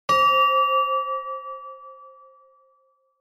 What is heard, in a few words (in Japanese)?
のど自慢